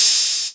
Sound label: Cymbals Open HH; Vengeance Samples